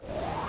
suck begin; World Of Goo

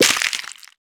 honeycomb destroy

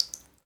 drip, water; World Of Goo

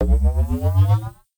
wave charge; CrossCode; battle

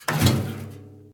shake; Chapter5End

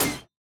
hit metal light; CrossCode; battle

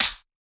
hit medium; CrossCode; battle

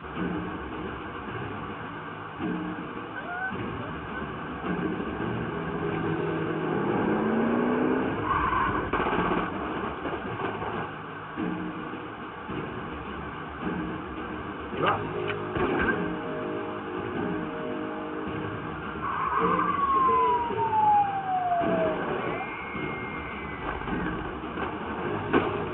song video store; Katana ZERO

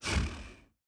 buffalo attack; CrossCode; battle

